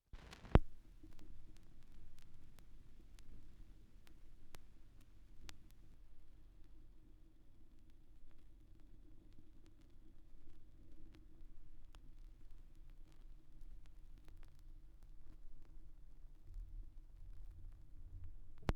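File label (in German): schallplattengeraeusche, record noise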